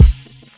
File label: kick; hl2mp; mpc